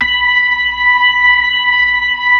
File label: B-3 Chorus